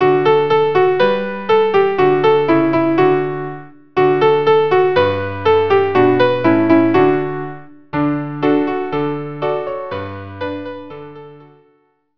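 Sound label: viola piano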